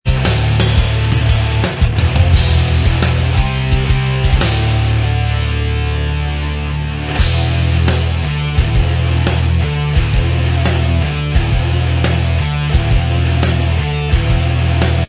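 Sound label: instrumental Track, backing tracks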